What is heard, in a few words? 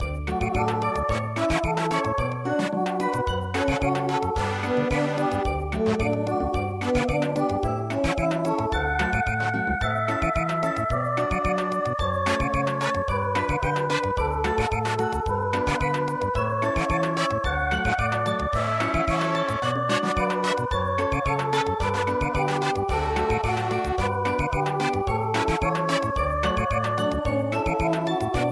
in D Samba; Canon